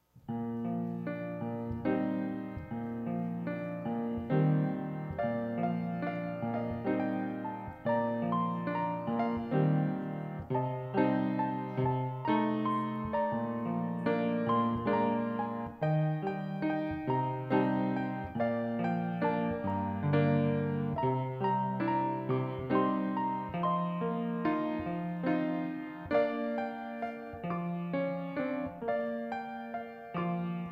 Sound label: instrumental; Showers Of Blessings; calvaryministries